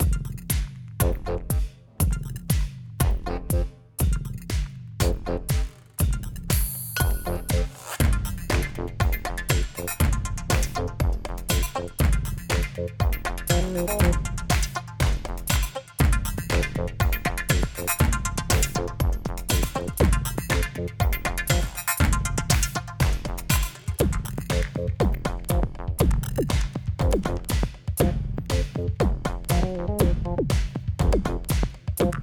Battlefield Loopable; Unspottable; Xbox Series X|S

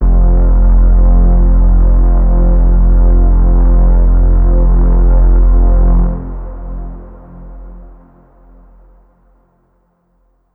Overlay Drone; Act2 Rezoning

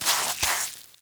Fx Paint Swi; Levels Hub Orleans 01 Draw Wallpaint; Fx Paint Swipe

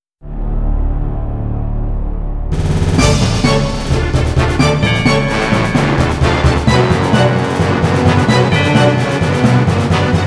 Strongest Impact; Chocobo no Fushigina Dungeon 2 original soundtrack

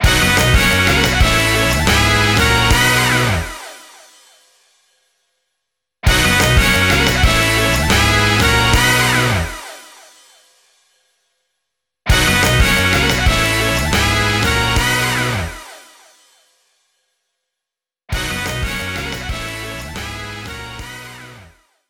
Jingle Win; Sega Superstars Tennis; PS3; Wii; Xbox 360; From Super Monkey Ball

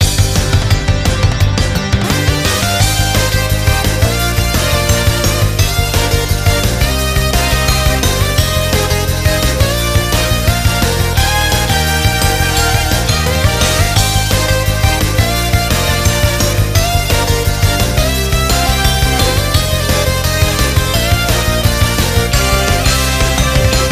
Music Menu event

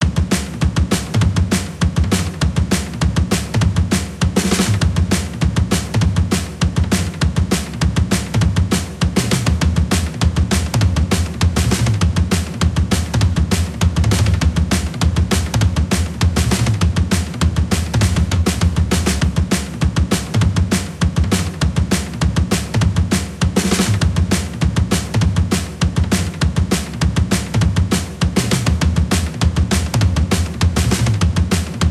Drum Beat; Skylanders 5 SuperChargers; Beachcomber

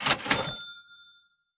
sell item; PC Building Simulator

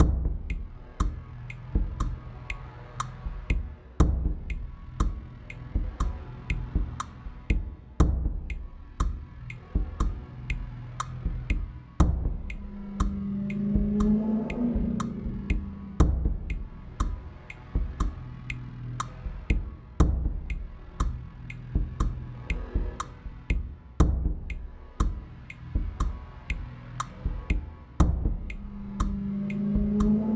Test beat

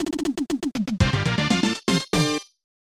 Birdie; the irem skins game; snes

